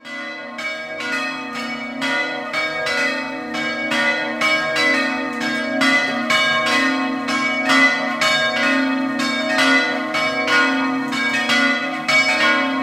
Fanna, campane; Madonna di Strada

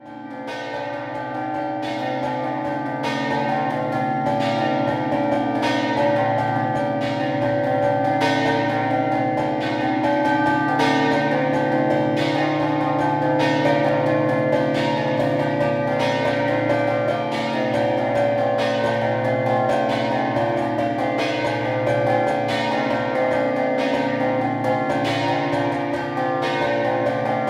POZZUOLO DEL FRIULI, campane

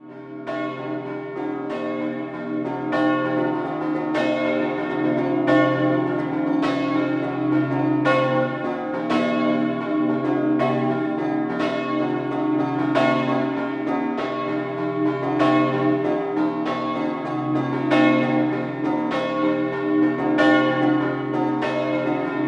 Prato Di Resia Scampanottata

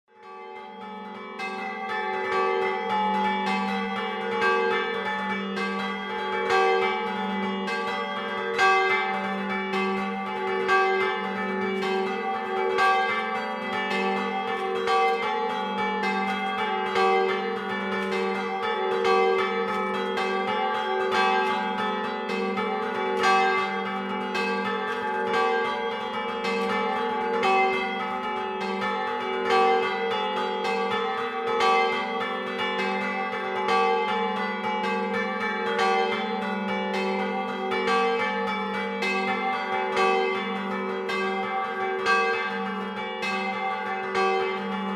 scampanio mione

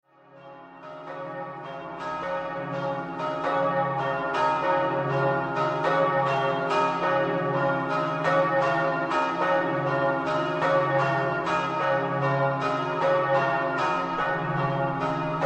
PONTEBBA, campane

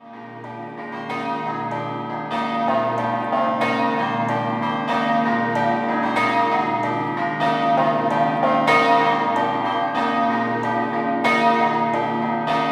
Codroipo Scampanottata, campane

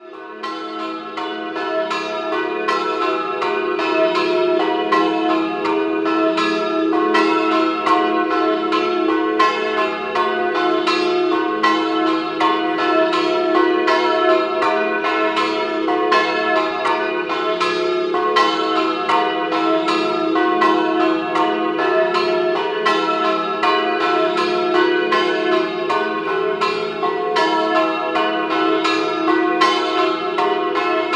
Cavolano, campane

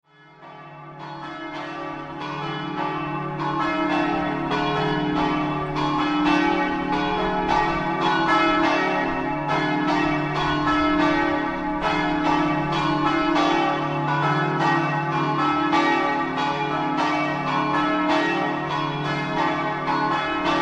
PLATISCHIS, campane

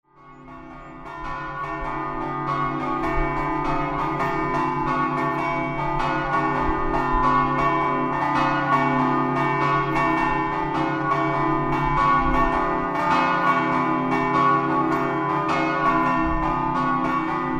Scampanottata, campane

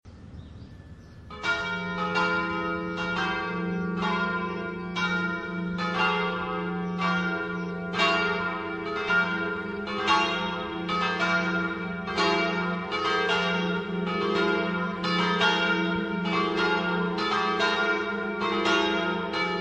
campane; CAVALICCO

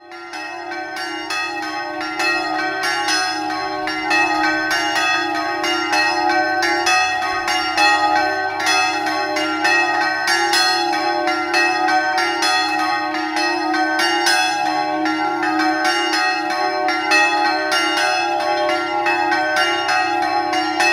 Pordenone Sacro Cuore, campane

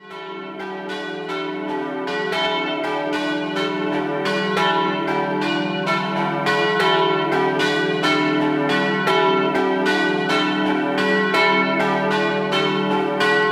Capriva, campane

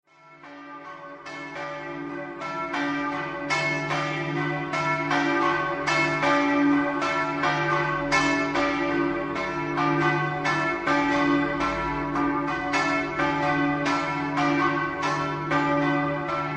CAMPOFORMIDO, campane

